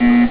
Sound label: buzzer; dot2dot